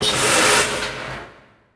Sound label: steam blast; Star Wars Obi Wan; XBOX